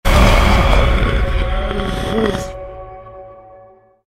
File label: demon jump scare